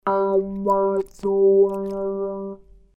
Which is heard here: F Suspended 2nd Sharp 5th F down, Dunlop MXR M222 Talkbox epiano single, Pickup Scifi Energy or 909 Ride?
Dunlop MXR M222 Talkbox epiano single